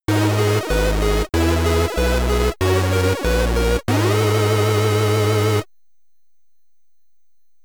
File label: Stage Clear! (SID Stereo); Eskimo Games; commodore 64